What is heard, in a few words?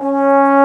MELOW BONE; AKAI S-Series CD-ROM Sound Library VOL-2; ORCH